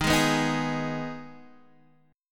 Eb Suspended 4th Sharp 5th Eb Guitar Half Step Down; Ebsus4#5